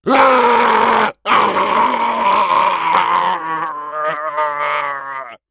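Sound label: zombie screams dead man's roar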